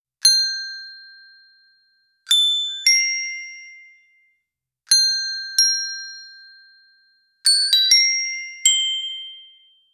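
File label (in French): carillon doux et feutré, school bell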